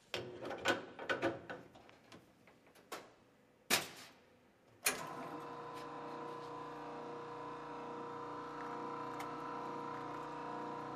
Cappuccino Machine, In Entire Opera, Coffee Machine; Cappuccino Machine, In Entire Operation Cu.